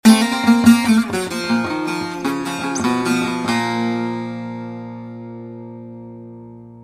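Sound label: Turkish Message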